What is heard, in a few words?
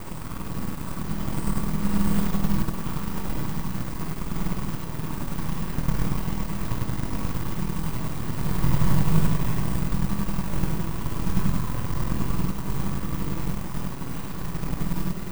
Drone Ambient; Drums & Drones